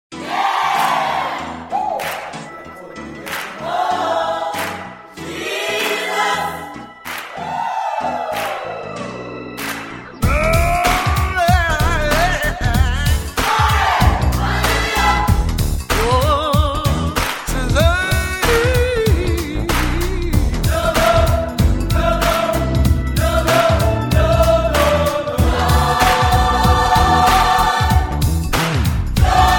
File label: Gospel